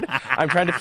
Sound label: evil laugh